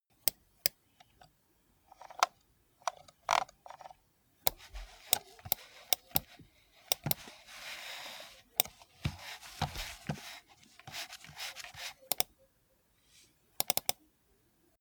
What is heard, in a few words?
computer mouse usage sequence